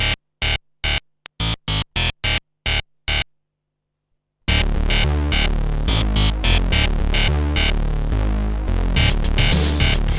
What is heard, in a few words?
house of techno; Trackers; Modland; house of techno i